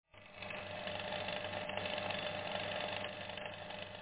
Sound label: fan, sound